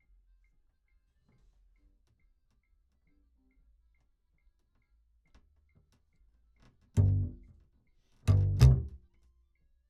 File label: Bass